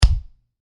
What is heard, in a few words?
Impact Solid, Thud; Thuds